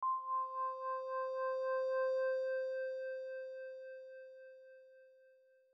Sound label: bell; bespoke - Floral v.1